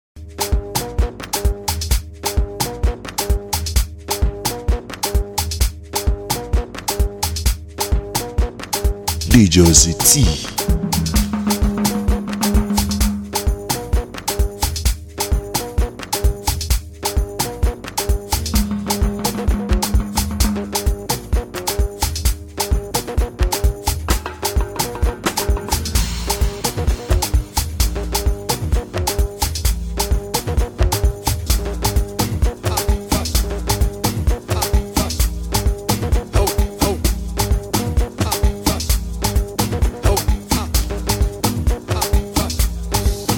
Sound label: South African Groove Beat; Djozzytee; Original Refix Beat